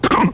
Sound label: eagle, Baboon